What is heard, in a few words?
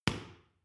boxing paw hit medium